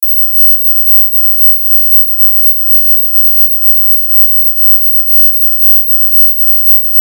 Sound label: the buzzer, mosquito ringtones